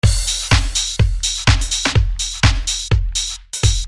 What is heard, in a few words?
disco